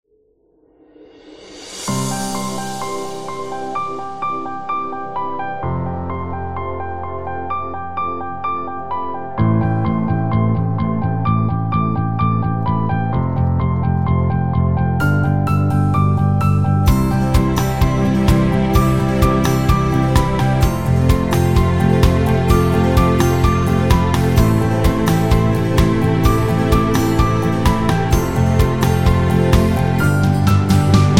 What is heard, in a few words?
Cinematic and Upbeat; corporate music vol 8